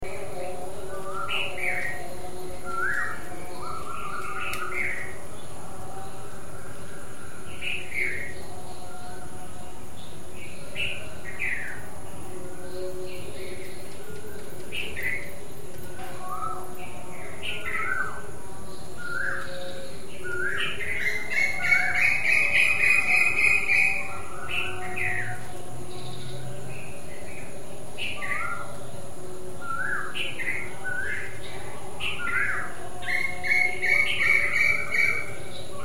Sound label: Koel bulbul